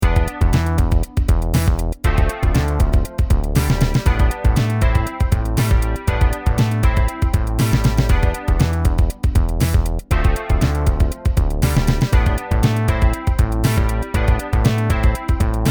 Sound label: drums and bass